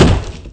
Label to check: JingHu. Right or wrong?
Wrong